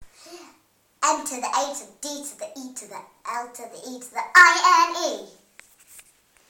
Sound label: rap spelling by 5yr old